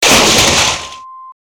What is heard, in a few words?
water